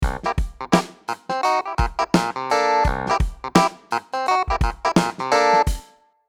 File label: Clav Groove